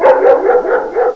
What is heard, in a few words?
MULTI DOG; M8; CMI Factory